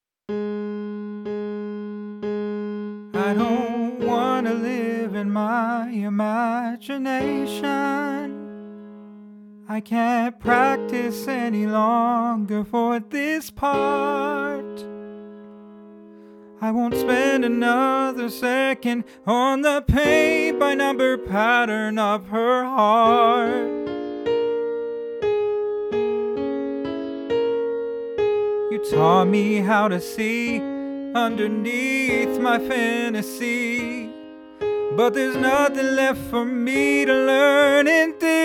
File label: VOCALS